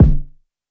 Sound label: Kick; Ableton Live 12 Lite; Core Library; Drums